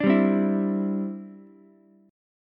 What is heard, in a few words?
D 7th Suspended 2nd Suspended 4th D, Ukulele; D7sus2sus4 strummed